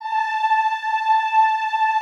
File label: EEH, Classical Choir; Best Service; Extended Classical Choir